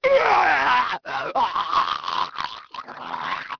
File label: M shake; People